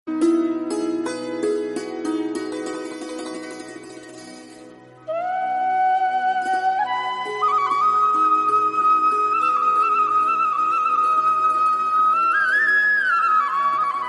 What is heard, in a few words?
soft bansuri